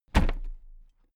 toolbox place upright regular

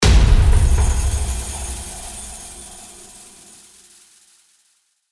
hit delay tinkle

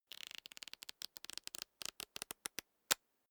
small book riffle through slow

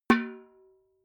metal bucket hit ring soft

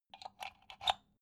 socket plug soft

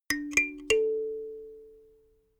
notification kalimba triad up major

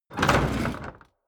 chest wood medium open

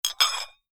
stacking plates

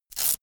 velcro large open quick

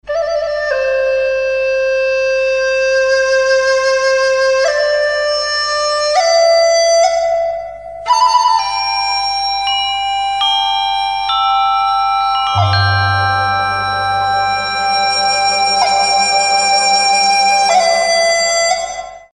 flute asian

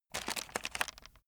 chocolates take

